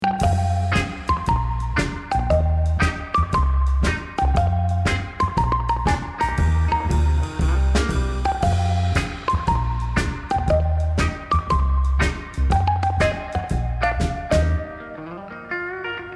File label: Loop; BrancaLoop